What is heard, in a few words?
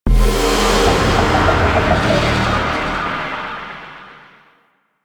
Void Dragon Ring Correct; Enemies; Warframe; Duviri